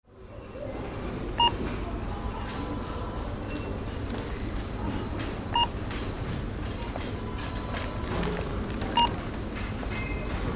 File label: Supermarket ambience beeping from cashier